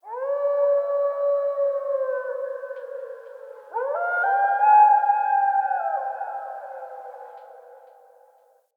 Wolf Cub Landscape Howl; Vocals; Animals

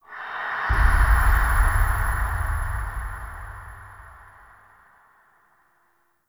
Mirror Hand Touch; Warframe; The War Within; Cinematics

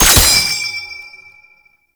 Bayonet Last Shot; Warframe; Tenno

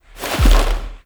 Drifter Pull; gameplay; Warframe; NineteenNinetyNine; Stage12PartyOfALifetime

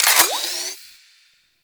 Water Sprayer Alt Pump Charged; Warframe; Weapons

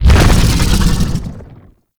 Biped Vocal Death; Warframe; ManInTheWall

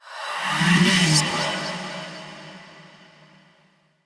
Hand Compass Vocal; Warframe; Duviri

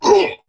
Pain; Warframe; Dax Knight; Battle Barks

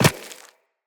Walk Rock, Footsteps; Operator

